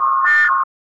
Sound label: futuristic alert with pap, alerts